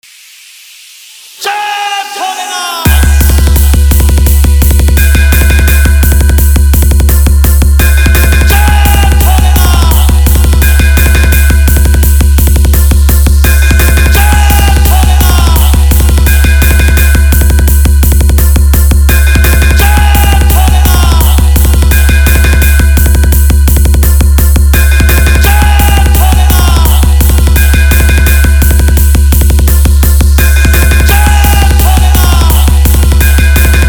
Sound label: Horse Beat Competition Mix